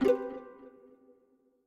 Confirm

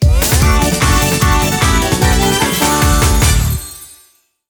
Radio Idol box Jingle; Daigasso! Band Brothers; 3DS

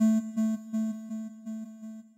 Destroy Melod

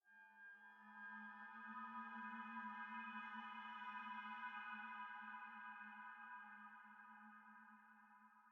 Env Field Day Cave

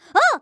wobble; Ringling Bros. and Barnum & Bailey; Wii

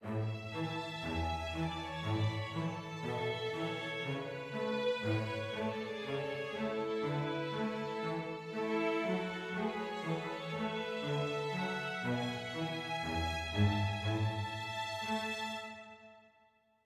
happy med

